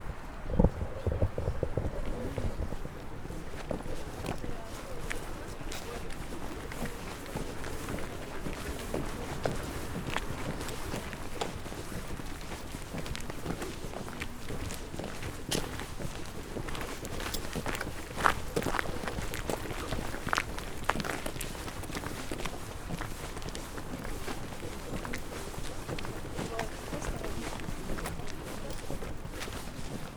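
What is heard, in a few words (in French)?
Chemin de gravier, asmr; campus universitaire de la bouloie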